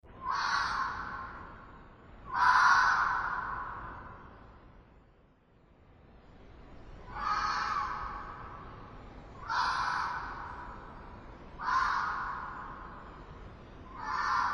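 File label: fox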